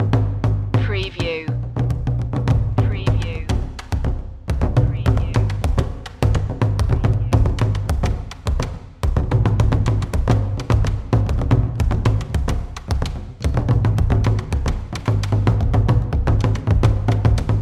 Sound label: african drums loop